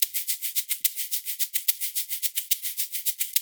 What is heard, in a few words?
LOOP, SHAKERS